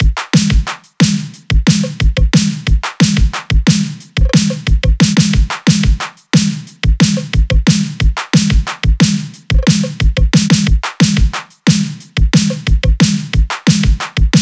drums 90, Drum Loops, Full Drums; Pulsar; Slate; MIDNIGHT Sample Pack

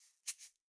shaker single hit

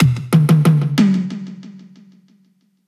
tom steel; Drum One Shots; Slate; SD MIDNIGHT Sample Pack

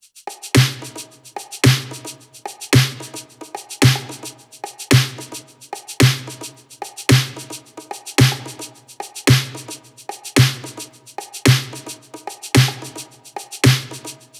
top Drum Loops 110; SD MIDNIGHT Sample Pack; Slate

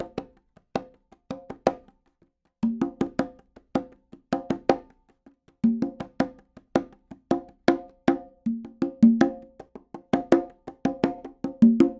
afroconga loop 80

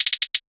hat unwind, Rolled Hat; Hip-Hop; Drum One Shots; SD CAICOS Hip-Hop Sample Pack; Slate

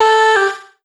Vocal one shot A

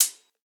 hat, drum sample; Hats

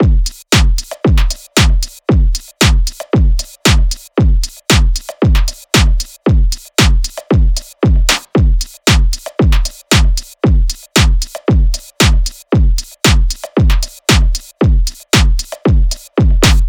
drums 115, Full Drum Loops; drum sample pack; SEISMIC; Slate

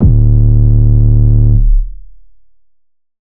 808 oneshot Brown D; Slate Digital BASSHOLE Sample Pack

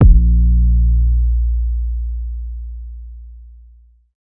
Synth oneshot Long Bb, Synth Bass One Shots; Slate Digital BASSHOLE Sample Pack

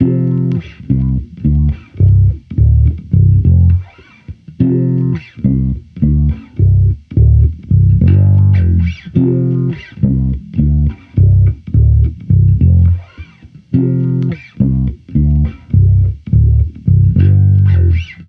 Amped loop 105 Dm, Electric Bass Loops; Slate Digital BASSHOLE Sample Pack

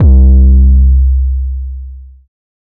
Synth oneshot Long C, Synth Bass; Synth Bass One Shots; Slate Digital BASSHOLE Sample Pack

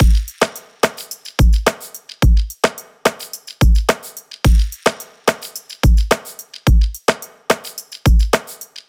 drum loop 108; Pop; Drums; Full Drum Loops; highland; Slate; SD PASADENA Pop Sample Pack

